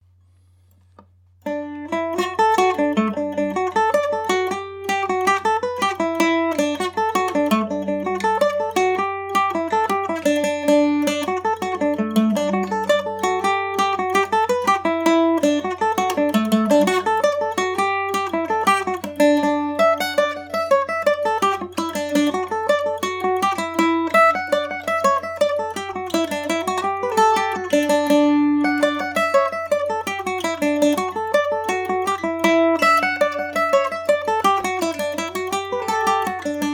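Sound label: D major md304, Irish mandolin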